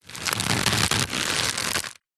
rope thick old tension movement creak stress

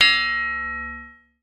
impact metal strip large ground; zapsplat

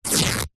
foley t shirt thick rip

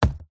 foley cardboard shoebox empty set down thud; zapsplat

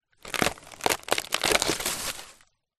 foley palm tree leaf branch fall off tree

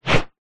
warfare axe or other heavy weapon swing

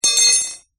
foley metal rod down on ground

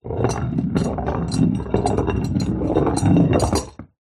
large empty clay demijohn roll on wooden floor; foley

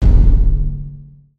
sound design dark drum hit tone; dustyroom

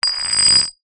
metal bar small drag scrape hard ground; foley

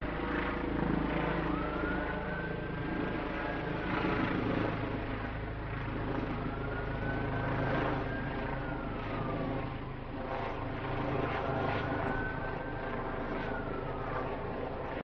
police helicoptor newcastle upon tyne uk

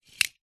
leisure toy cars x2 drive crash into each other; hotwheels

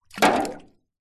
foley stone small drop into metal tank of water; zapsplat

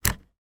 van windscreen wiper switch dial turn iveco; transportation; sound effects one

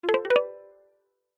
multimedia game tone short fast positive plucked; zapsplat

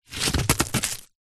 stick branch large from tree drag ground

